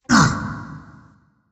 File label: grunt, firegiant; smite